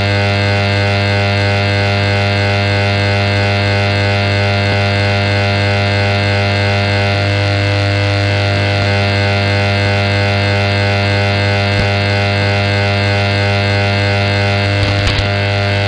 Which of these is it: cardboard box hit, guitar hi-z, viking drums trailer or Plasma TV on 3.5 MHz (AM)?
Plasma TV on 3.5 MHz (AM)